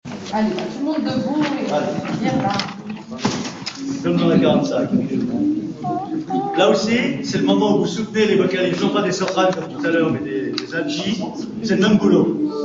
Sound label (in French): basses ténors, travail vocal